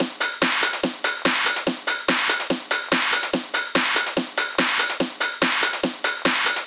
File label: 144 BPM Loop, Drums & Perc; Vengeance - Essential Clubsounds Vol.5; Flight Kit Root Amin